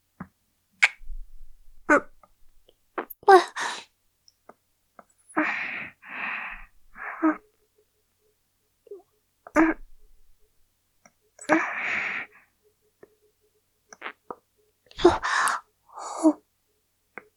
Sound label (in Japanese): 受け身; キス